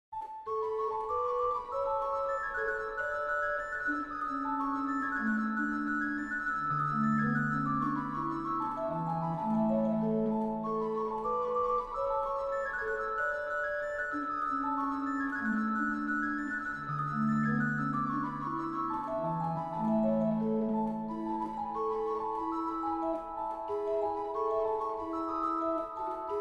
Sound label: Noel Var, organ; Balbastre; callido